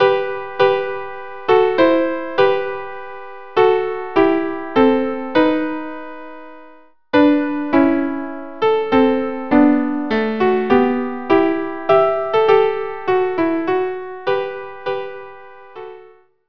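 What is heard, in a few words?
viola viola; Morning Prayer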